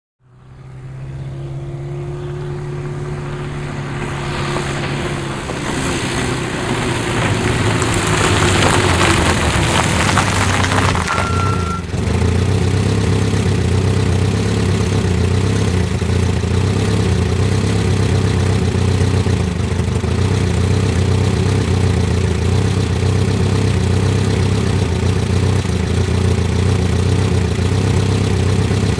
Automobile; In / Stop / Off; Austin Ruby Up To Mic Changing Up Gears, Stops At Mic, Idles And Switch Off. Dirt Road.